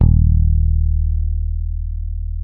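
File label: Sadowsky 5 MUTE D#1, Bass; Sampleheads; Will Lee Bass Library; SADWSKY5MUTE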